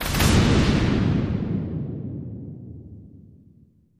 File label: Missile Lrg Launch